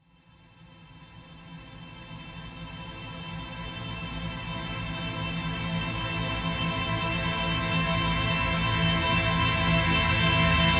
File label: 128BPM Guitar Stroke A, Melody Fills; 8Bars; Vengeance Samples